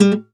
KONZERTGIZ, Alpine Volksmusik

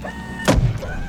ROBOTE; Best Service; Advanced Mega Effects

